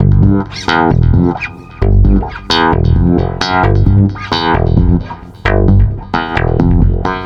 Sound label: Stick Loop, Stick Bass